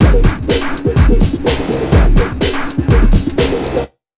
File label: BEA, Big Beat Drumloops; USB Soundscan vol.25; AKAI; NYC 125